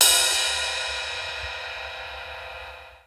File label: Cymbals